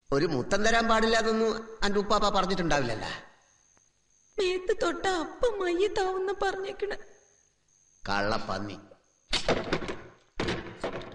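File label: Malayalam background music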